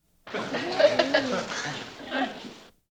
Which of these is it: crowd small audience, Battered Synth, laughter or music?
crowd small audience